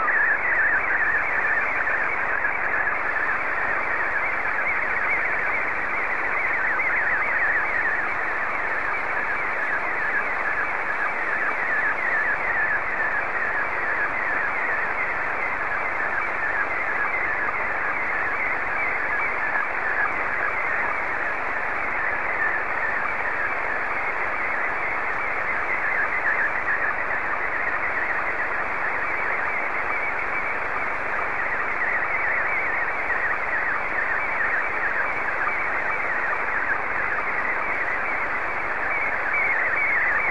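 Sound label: Scottie 1, slow-scan television; BI7ALF; SSTV; VK4MSL SSTV RX @ QG62ln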